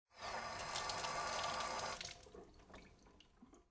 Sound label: faucet; WMBonusXP